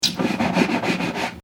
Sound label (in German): bad mund spuelen